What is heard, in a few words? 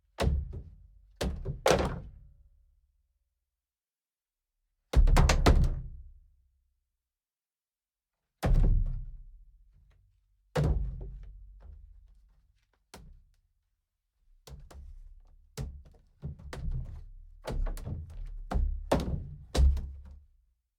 crash layer kick drum